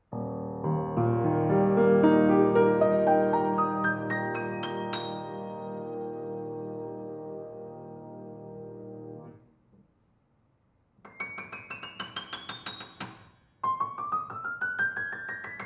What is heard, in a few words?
Yamaha C2L